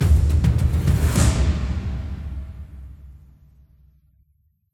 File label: Combat Normal End; BIOMUTANT